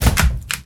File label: doors